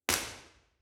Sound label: Recital Hall Curtains, B-Format; room survey; Messiah College; Recital Hall Dead